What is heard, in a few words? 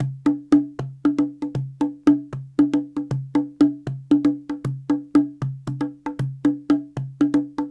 Cahon